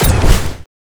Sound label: launch; S.P.L.U.R.T-Station-13